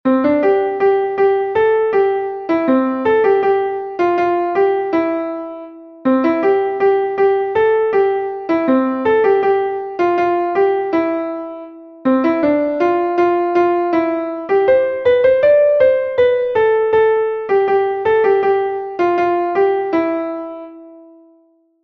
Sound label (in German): sei gegruesst sankt martin gottesmann, klavier